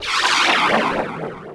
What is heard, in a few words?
spellcast